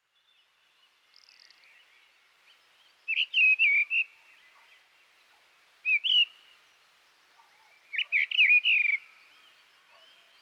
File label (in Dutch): Grote lijster, vogelgeluiden